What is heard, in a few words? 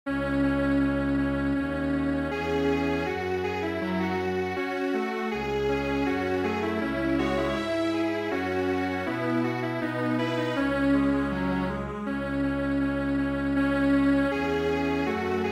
Strings Quartet